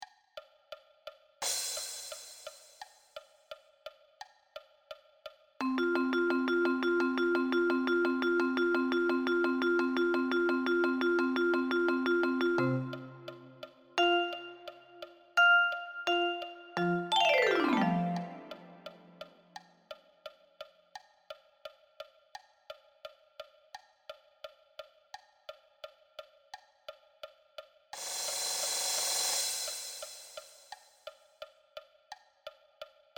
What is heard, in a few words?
Vibraphone